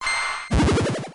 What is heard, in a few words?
Acupressure; Pokemon Essentials; Pokemon Iberia V1.03